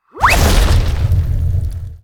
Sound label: Character Attack